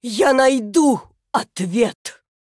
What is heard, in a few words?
Female Human Attack